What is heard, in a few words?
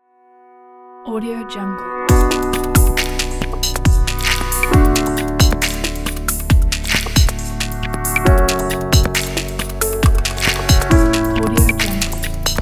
abstract glitch inspiration; lfmusic